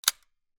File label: weapon gun ar 15; still north media